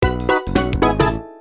level start; phone; fruit factory 2

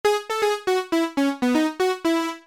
level completed, phone; fruit factory

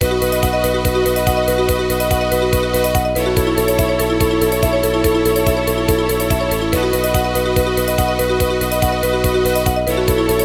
interlude, phone

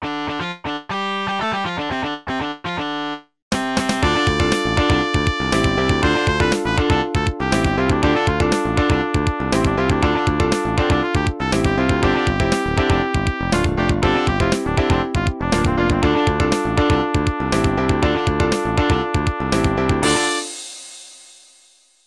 track unlocked; phone; edge rally 2

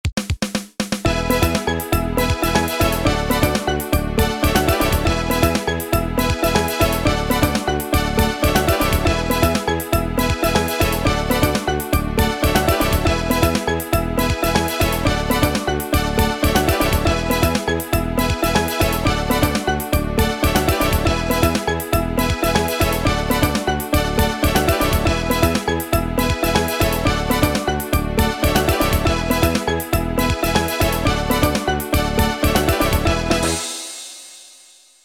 interlude; phone; fruit factory 2

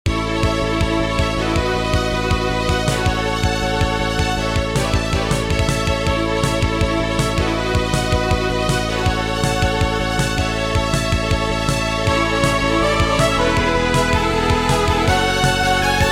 ending; phone

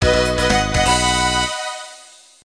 level completed, phone

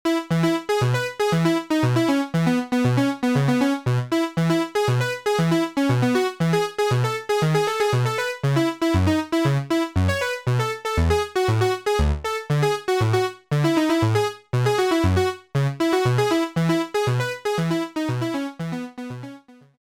title & menu; phone; fruit factory

